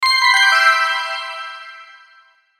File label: Logo Musical Bells Melody High; Sonic Logo Sound Effects; Production Element Imaging Element Accent Transition